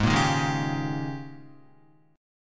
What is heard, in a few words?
Ab Major 7th Suspended 2nd Suspended 4th, Guitar; strummed; JGuitar